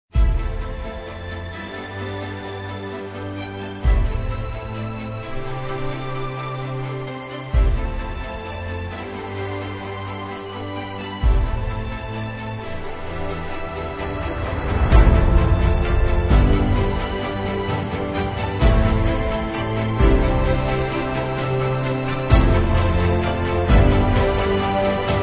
Epic Bridge, 励志、有力、鼓舞人心的管弦乐适合于电影、预告片、励志视频、成功视频以及任何其他需要英雄主义和史诗音乐的项目。 有节奏的弦乐，强有力的喇叭和史诗般的打击乐。; 音乐素材 史诗般的 Epic